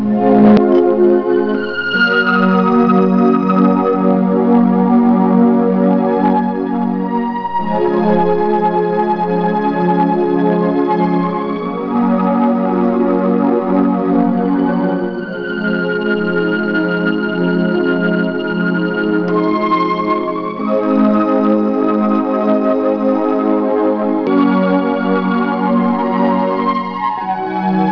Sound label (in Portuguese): teclado